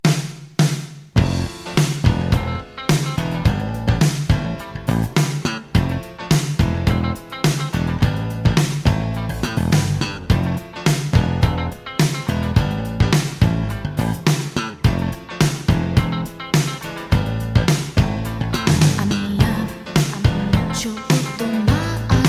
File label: Female J Pop Boogie Funk